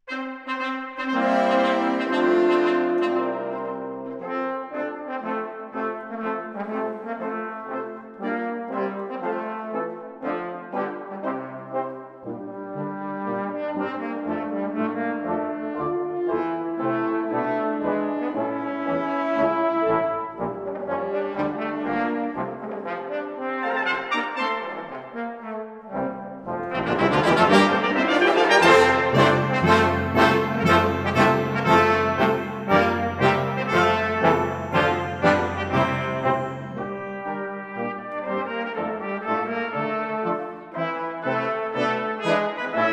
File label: brass; Toreador